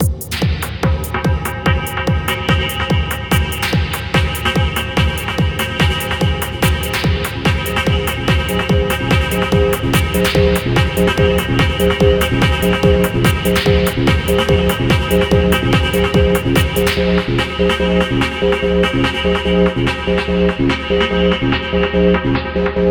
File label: Techno Techno; Buckshot Roulette